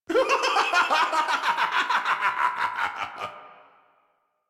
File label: laugh; Warframe; Tyl Regor Boss; Efforts